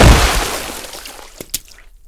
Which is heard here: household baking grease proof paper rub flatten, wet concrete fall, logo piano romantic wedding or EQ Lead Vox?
wet concrete fall